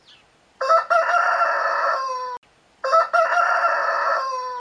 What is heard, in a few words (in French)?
coq